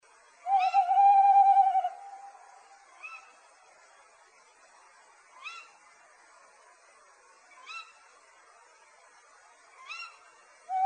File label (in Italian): Allocco